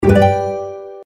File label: fairy scatter; games; Fairy Dust